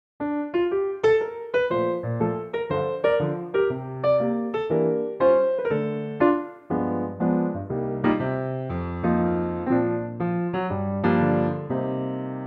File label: Bb piano